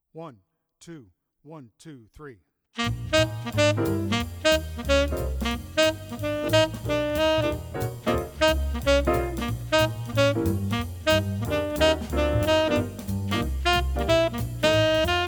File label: tenor sax audio sample